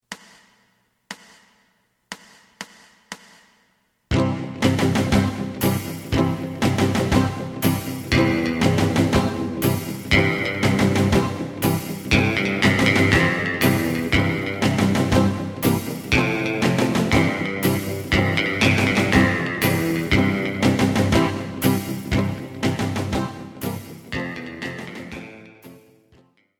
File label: bass